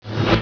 basic Flyer Shoot; F.A.R.M. Patrol

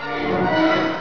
rear 2 front; ところっちppc2.27

